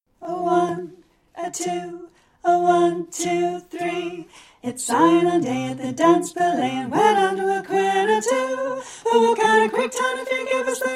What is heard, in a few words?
all parts, Folk Choir music; Sign-on Day